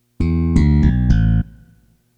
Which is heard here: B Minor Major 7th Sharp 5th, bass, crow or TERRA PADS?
bass